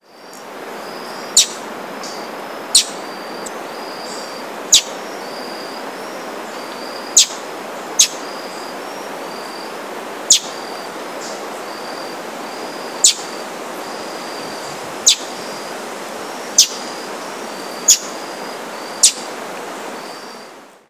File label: Conophophaga lineata; N Cunha